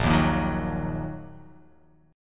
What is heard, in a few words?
Bb Suspended 4th Sharp 5th Bb down, chord; strummed